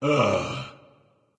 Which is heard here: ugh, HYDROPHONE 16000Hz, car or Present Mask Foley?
ugh